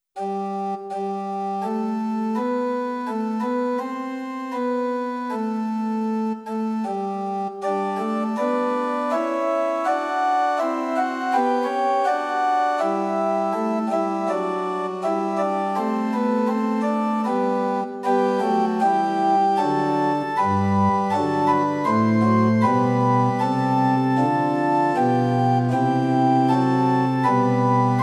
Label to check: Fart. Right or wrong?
Wrong